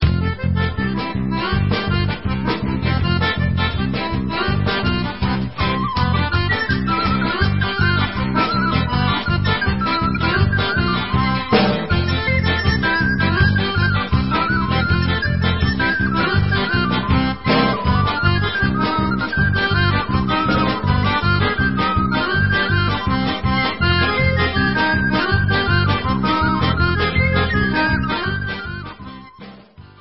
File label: Krakoviak, Polish, Instrumental